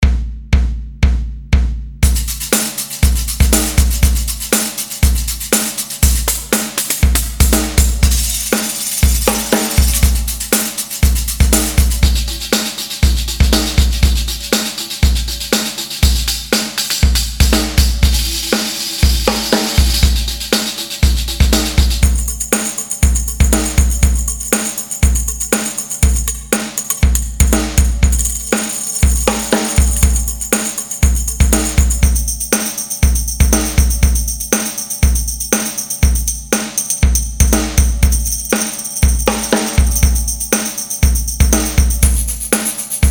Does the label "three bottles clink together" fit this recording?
No